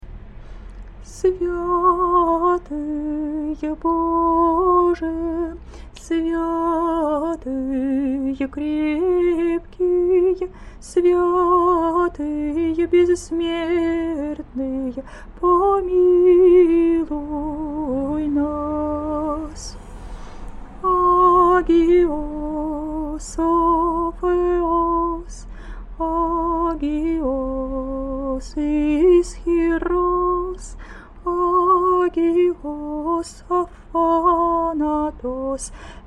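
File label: trisagion byzantine, singing; sopr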